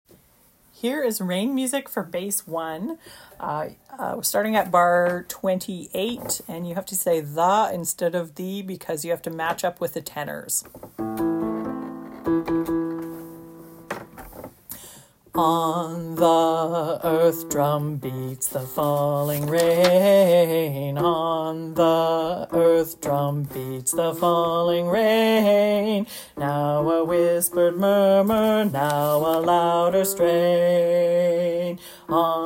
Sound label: rain music bass